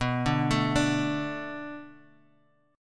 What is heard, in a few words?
B Minor 7th B, Guitar; Bm7 arpeggiated